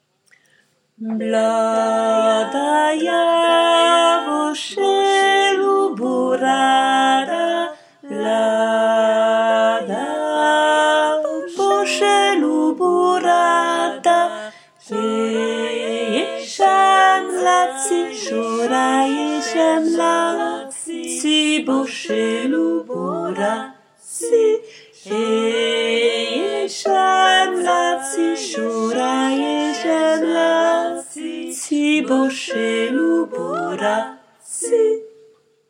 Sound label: Pisnicka voix basse